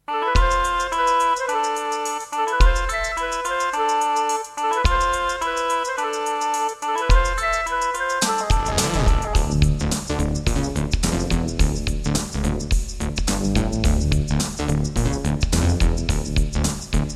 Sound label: Creative Sound Blaster AWE64 Gold; Lost Vikings